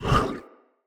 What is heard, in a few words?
Jump Short, Vocals; Enemy; Characters; Corrupted Sproutfolk